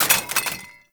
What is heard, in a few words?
grenade launcher mag drop; Weapons; Scaldra